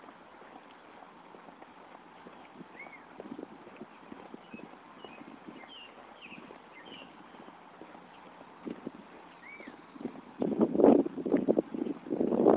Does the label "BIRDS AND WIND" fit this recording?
Yes